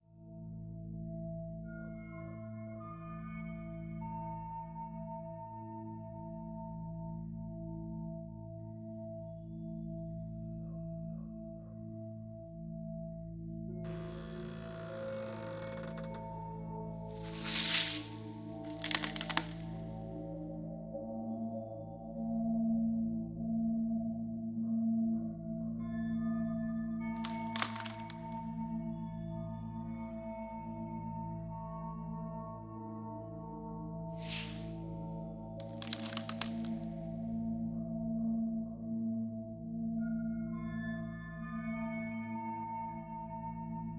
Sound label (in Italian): AMB Palazzo reale; BLIND QUEST - The Enchanted Castle